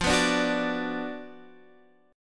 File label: F sharp Major 7th Suspended 4th Sharp 5th, F, chord